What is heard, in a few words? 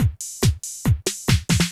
techno 140